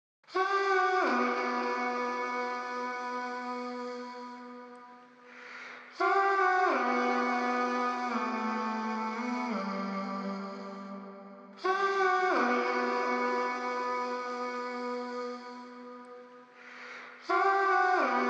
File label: 85 BPM C Min Vocals; Loop Stems; Cymatics; Miami Guitar Pack - Pt 2; Rust